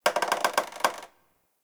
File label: Dead Tree Texture; Ambience; Mendicant Area